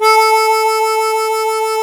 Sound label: HARMONICA